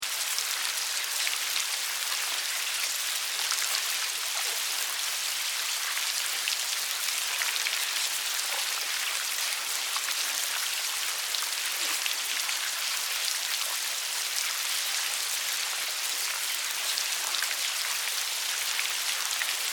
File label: Larva Maggots Move, Larva, Maggots, Movement, Large, Group, Wet, Slimy, Insects, Bugs; Larvae; Sound Effects:Animals:Insects; Blastwave